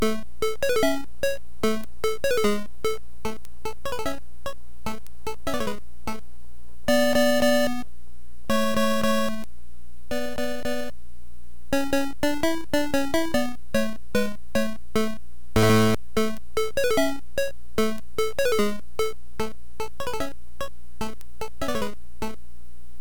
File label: play ball background music; Gameboy Camera; Gameboy game rip